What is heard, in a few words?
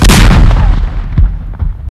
explosion, train; valve; dutch